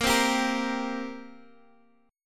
G Minor Double Flat 5th, Bb

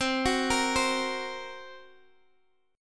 A sharp Suspended 2nd Sharp 5th C, Guitar

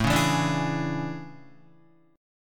A Major 11th, down Guitar; AM11; strummed; JGuitar

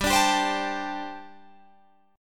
G Suspended 2nd Suspended 4th G, Guitar, Open G; chordshape; down

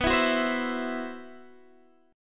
C Major 7th Flat 5th B

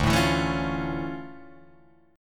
D Minor Major 9th D down Guitar Open D

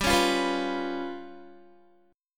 G 7th Flat 5th G, Guitar; G7b5 strummed

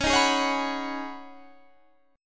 C sharp 7th Suspended 2nd Suspended 4th C sharp

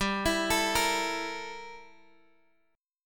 G Minor 6th Add 9th, Guitar, Open F; arpeggiated; Gm6add9

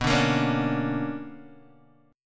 B Minor Major 9th, Guitar, Open D

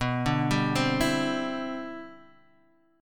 G 6th Add 11th B, chord; G6add11/B Chord {X 2 0 0 1 0} arpeggiated; 6add11:1,3,(5),6,11

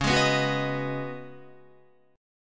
Db 9th Suspended 4th Db Guitar; 8,11,11,11,11,11; 4F,4A,5C,5G,5C,6E; strummed; chord shape; JGuitar

